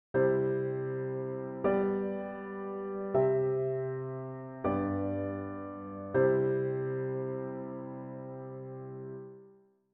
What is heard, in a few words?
harmonic error detection; ear training